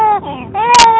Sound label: crying, baby cry